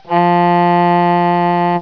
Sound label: tone